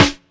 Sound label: shiny steel snare drum C sharp key; Duration 0:01; snare drum samples